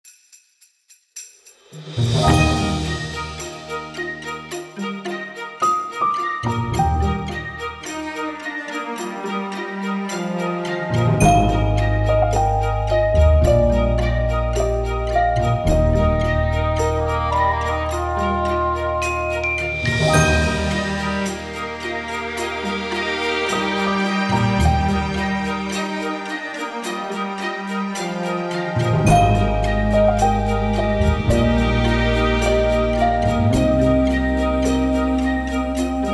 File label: A Peaceful Winter